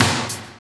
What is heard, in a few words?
Loop, Roland; Sound & Vision - Gigapack I